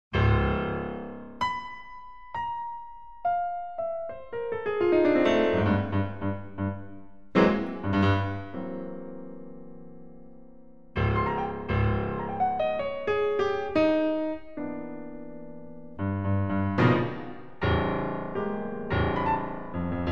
solo piano music

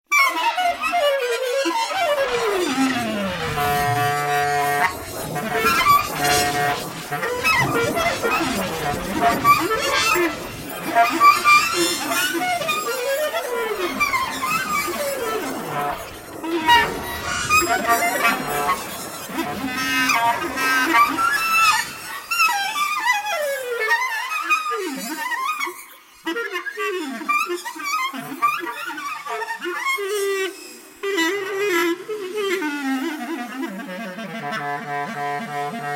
clarinet two computers

